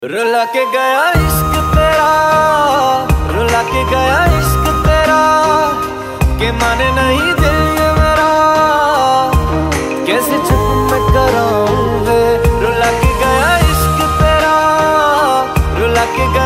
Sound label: Hindi Song Ringtone